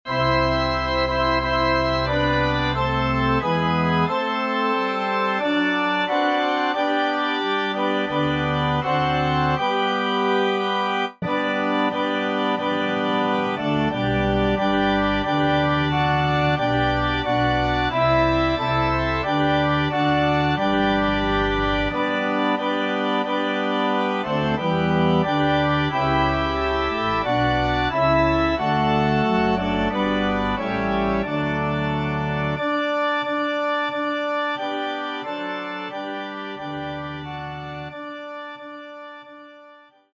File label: Hark The Herald, Organ